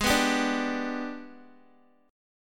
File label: Ab Minor Major 7th Flat 5th, Guitar; strummed; guitar chord; x,11,11,12,14,x; JGuitar